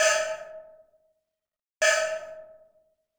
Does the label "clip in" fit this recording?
No